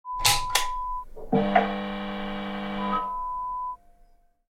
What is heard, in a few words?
Fluorescent Light